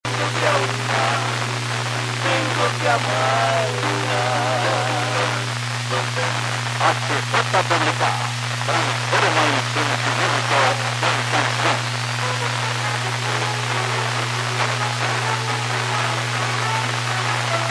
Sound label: milsat non us music